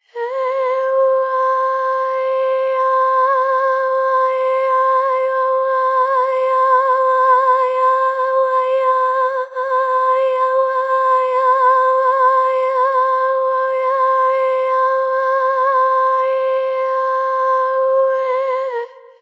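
Real Weave, lyrical and specialized vocal effects, a single note; vocal samples; Void & Vista; Kontakt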